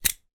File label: household keyring plastic catch in hand; zapsplat